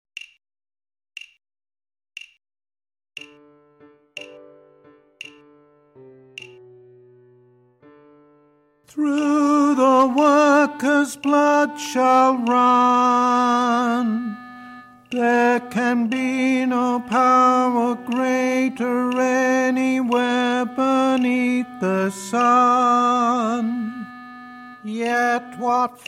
tenor practice track sung; solidarity

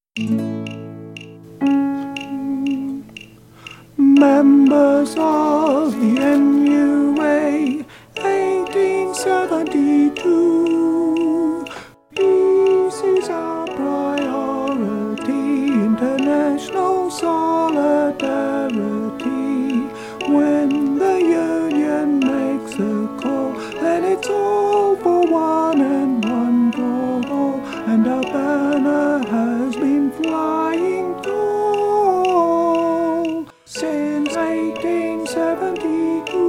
alto sung

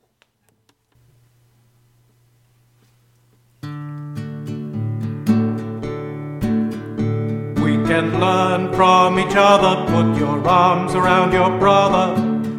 upper vocals

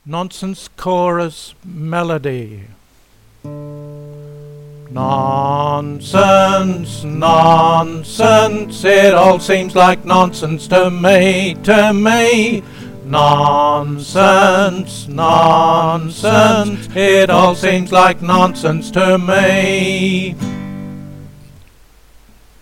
nonsense chorus melody

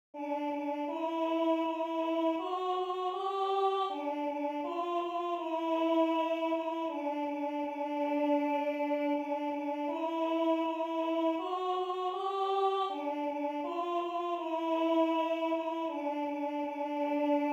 alto

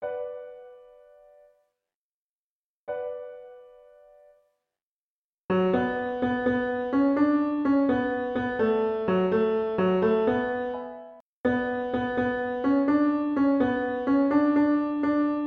Soprano no click

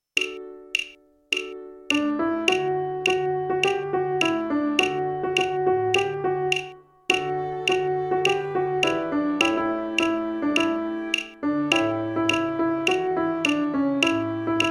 melody instrumental

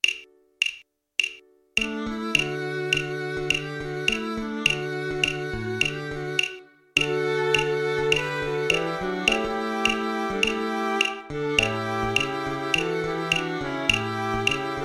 all parts instrumental

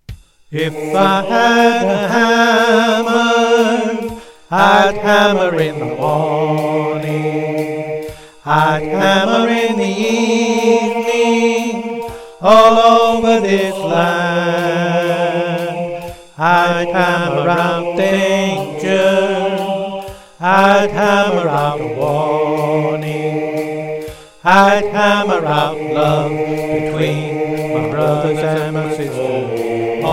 swing bass with vocals

